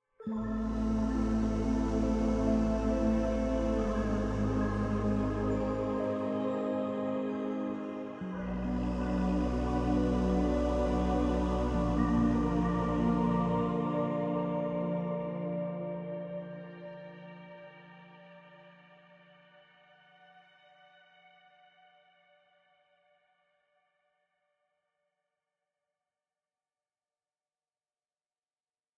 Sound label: Cave Music, Ending